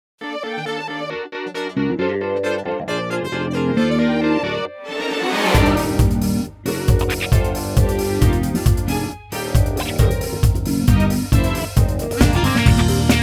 Off Vocal

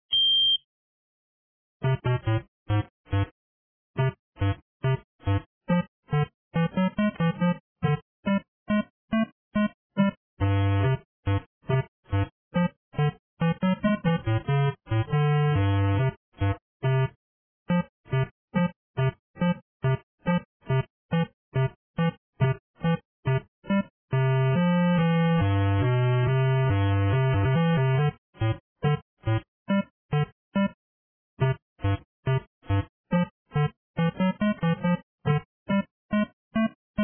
bass, 8bit BGM

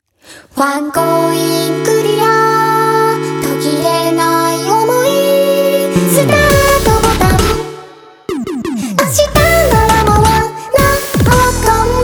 8bit love

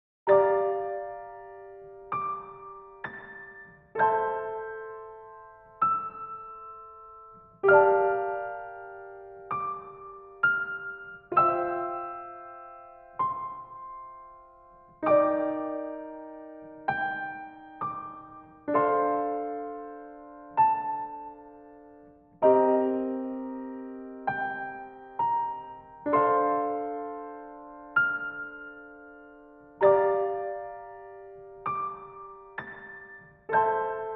PIANO

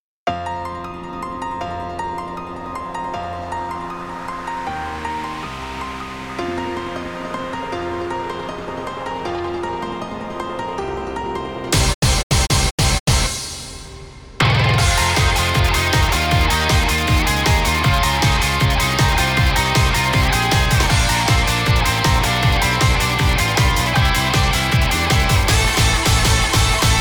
off vocal

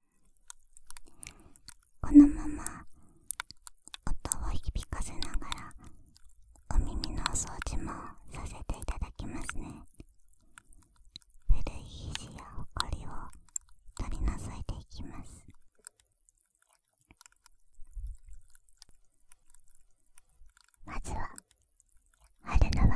brushing, ASMR

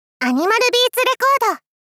animal beats

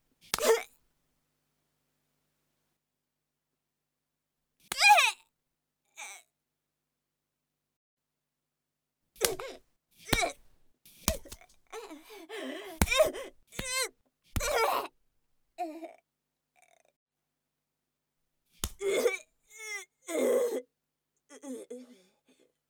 punch

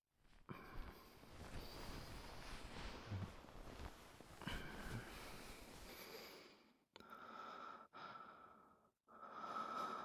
Ear lick

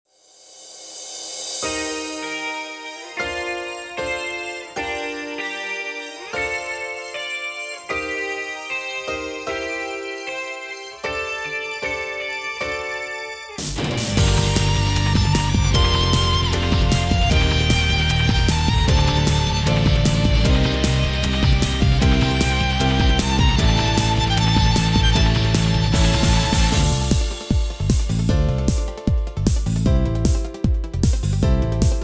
off vocal

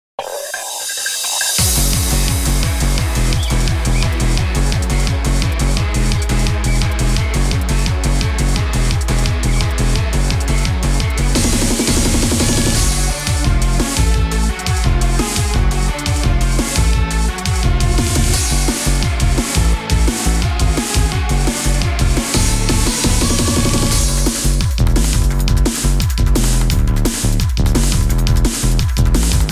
off vocal

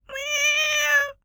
Meow, ASMR

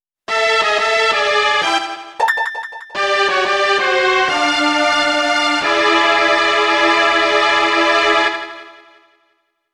gameover, 8bit

